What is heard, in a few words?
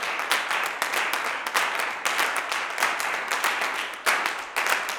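CLAPS 96, Gospel; Blues & Soul; G.CLAPS 96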